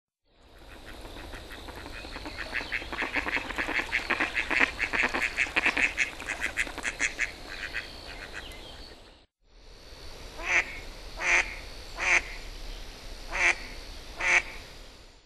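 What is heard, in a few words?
karugamo, Eastern Spot-billed Duck; hyogo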